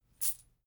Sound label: chain mail armor movement